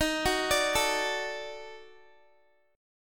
D sharp Minor Major 7th Flat 5th, Guitar; arpeggiated

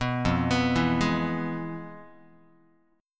F-Minor Major 7th Flat 5th, Guitar; FmM7b5 arpeggiated; JGuitar

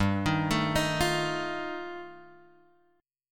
G 7th Suspended 2nd Sharp 5th G chord; G7sus2#5 Chord {3 X 1 2 4 1} arpeggiated

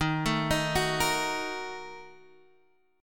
A sharp Major 7th Suspended 4th Sharp 5th, guitar

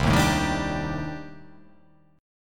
C Minor 6th Add 9th C

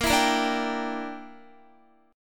Bb 6th Flat 5th Bb down Guitar; Bb6b5 strummed

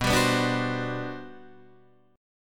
G Major 11th B

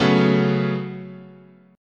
Db 7th Suspended 4th Sharp 5th, chord

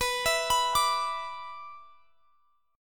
B-Minor Double Flat 5th; Bmbb5 arpeggiated; JGuitar

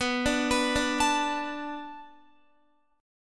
B Minor 7th B Guitar, chord; Bm7 arpeggiated

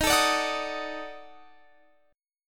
Eb Minor Major 7th Flat 5th Eb down Guitar Open F; EbmM7b5; strummed

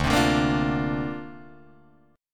D-Minor 7th Sharp 5th, D, Guitar, Drop D

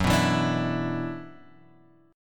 F sharp 7th Suspended 4th Sharp 5th; F#7sus4#5 Chord {2 2 2 X 3 0} strummed

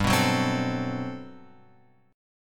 G Major 11th; GM11 Chord {3 2 4 5 X 5} strummed

